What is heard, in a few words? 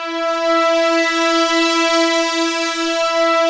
Prophet 5, E5; Hollywood Edge - Giorgio Moroder Rare Synthesizer Collection